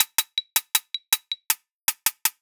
Ragga & Dub Reggae LOOP; USB Soundscan vol.27; AKAI

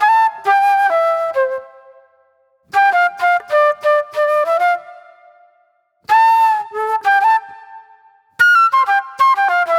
Acid Jazz Flute; Sonic Foundry (Sony Creative Software); Manhattan Flutes; Smooth Groove

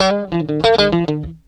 GUITAR, Funky GtrLicks

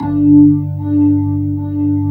G1, Keyboards Old School

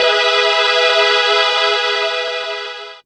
DR. LOOP; X-Static Goldmine 3; CHORDS

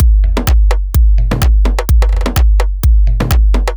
Practice C# 127bpm, House Drumloops; 90 s Sample CDs; Zero-G House Fabrik Drumloops; ZG Drumloops

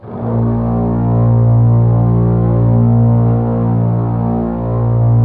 LOW VLNS A#1; Q Up Arts - Denny Jaeger Master Violin Library

